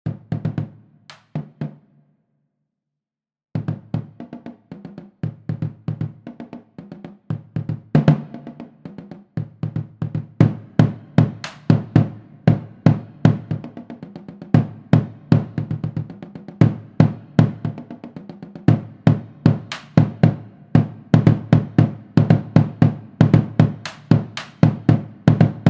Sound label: cadence bass Drums